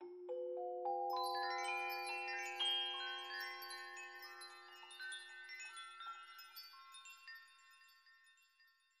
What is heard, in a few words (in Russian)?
zvuki volshebnoi fei